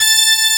BRS; WORS STATION; ROCK

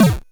Drums, Hits; DCM8

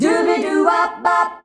"DUBIDUWA" F, FEMALES

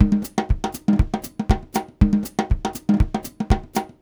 Voodoo Groove, Latin Percussion; Sonic Foundry (Sony Creative Software); Joe Vitale Latin Percussion; Grooves

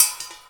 CANS, Percussion; InVision Interactive; Percussion Slam

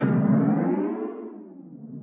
StarShip Sounds

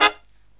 low chord, brass riffs

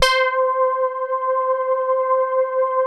KITCHEN SYN; Greytsounds; Scott Peers Sound Engineering

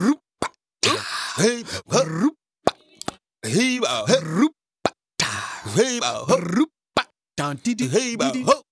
African Vocal Rhythm; Continental Drift Aftershocks; Vocals

